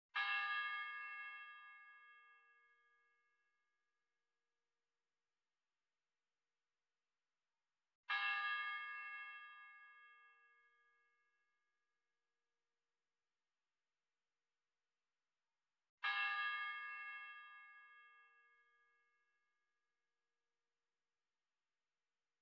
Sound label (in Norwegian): ta keklokka hort pa torvet i kristiansand